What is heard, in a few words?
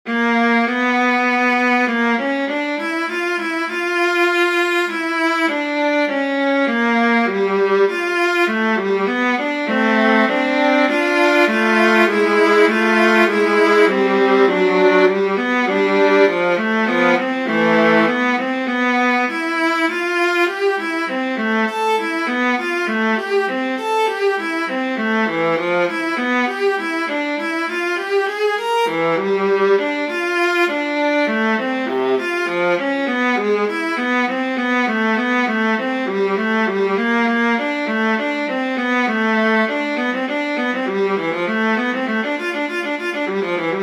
Viola coral